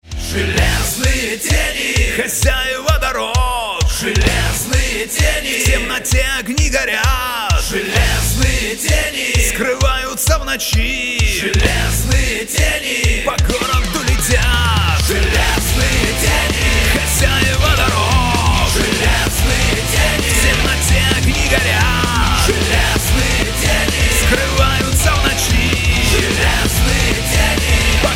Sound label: Ringtone